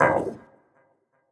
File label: ouch; smite; guan yu